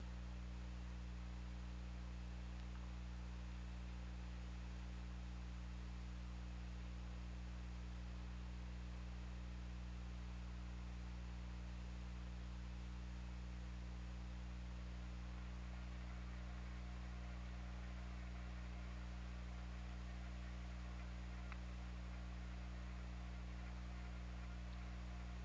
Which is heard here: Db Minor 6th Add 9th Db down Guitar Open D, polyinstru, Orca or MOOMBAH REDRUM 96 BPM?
Orca